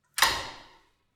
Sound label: Door Open Modern Lock Click Interior Room Echo